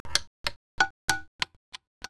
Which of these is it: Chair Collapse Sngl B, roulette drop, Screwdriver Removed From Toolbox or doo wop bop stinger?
roulette drop